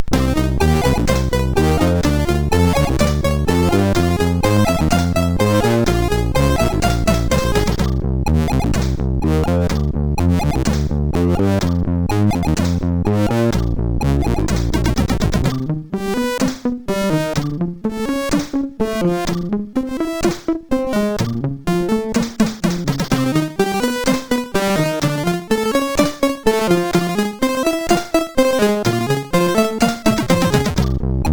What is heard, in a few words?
sid MOS6581R4; hvsc